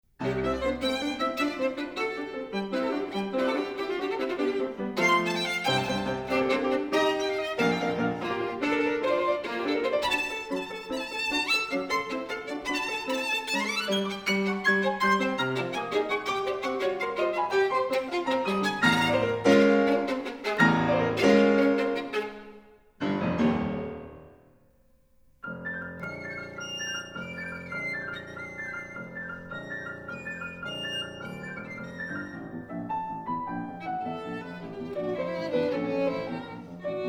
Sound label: Sonatina Vivace, string chamber; spring 1967